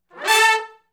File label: Hi Rip Up, horncraft for R&B, sections